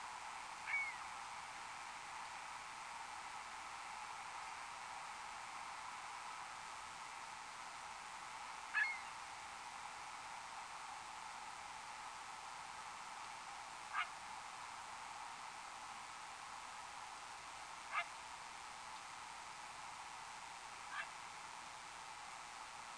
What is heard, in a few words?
gull and heron